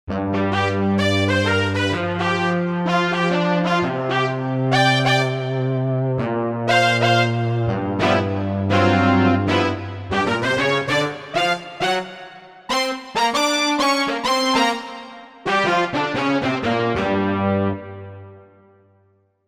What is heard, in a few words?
Phone Ens., Korg MSC-1S; Korg MSC-1S Variety